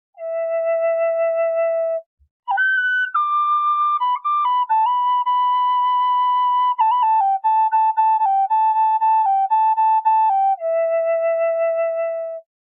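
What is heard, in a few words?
High E, Native American flute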